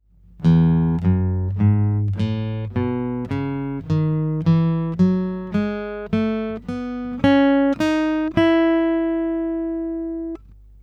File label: E Major Scale, guitar